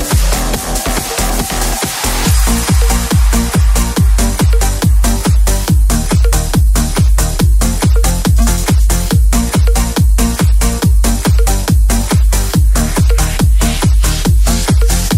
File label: samsung ringtones